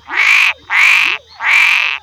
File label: Boana paranaiba